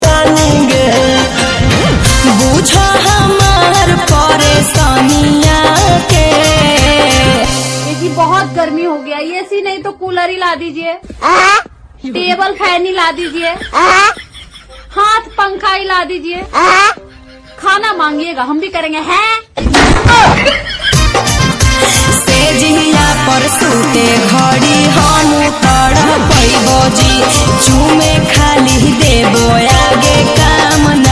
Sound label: new bhojpuri song